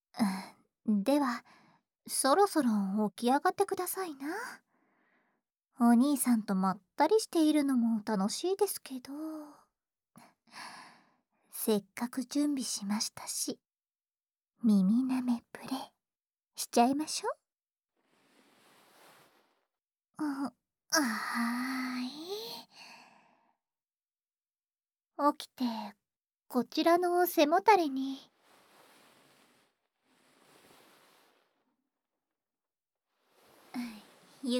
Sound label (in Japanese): 耳舐めプレイ…しちゃいましょう♪, ASMR